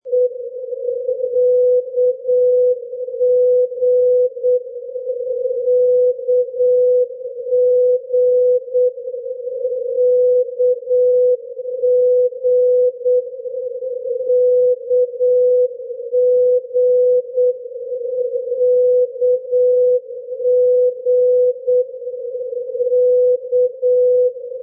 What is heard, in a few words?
ndb kramfors bjartra; europe; julussdalen kiwi